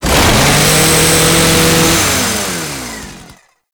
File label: Ghoul Saw Melee Swing Heavy; weapons; Warframe; Grn Ghoul Saw Player; Kuva Ghoul Saw